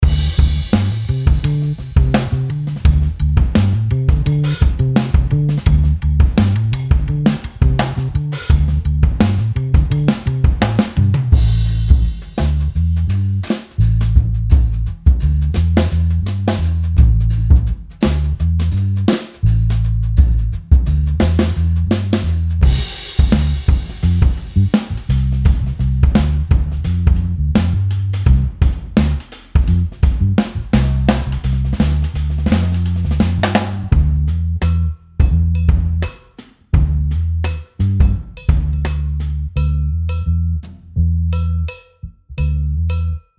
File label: P-Bass Loop; HDT; P-Bass Loop Pack 1